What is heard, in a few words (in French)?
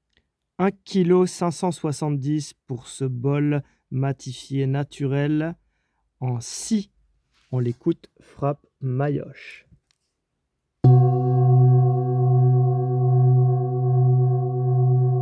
Bol Tibetain Martele Matifie Naturel 7 Chakras 7 Metaux Note Si